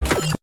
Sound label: autoplay off; Si Xiang